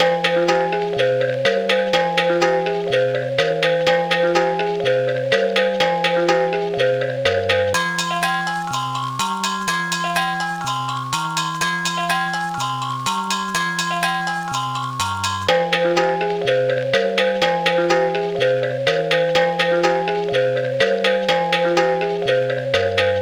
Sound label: 124 BPM F Min, Melody; Cymatics; 2022 Melody Collection Platinum Expansion